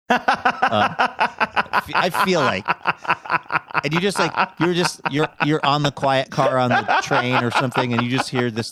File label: having very good laugh